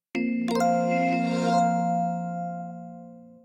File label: Ripple, notifications; Doov A8